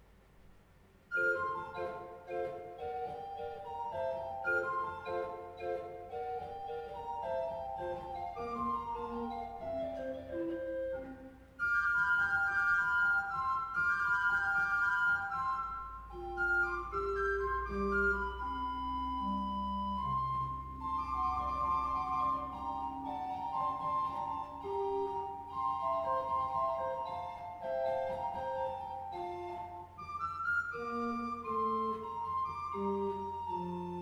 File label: Menuetto, orgels; Pesse; Leopold Mozart; Weinmonat